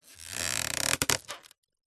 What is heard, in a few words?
household basket picnic hamper lid open or close movement creak